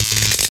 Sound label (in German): blitz getroffen; SCHORNSTEIN